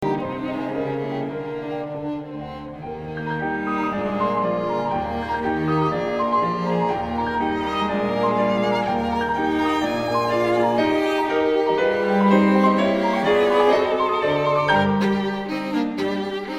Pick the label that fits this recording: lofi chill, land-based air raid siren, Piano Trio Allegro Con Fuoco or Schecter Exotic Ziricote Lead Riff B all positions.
Piano Trio Allegro Con Fuoco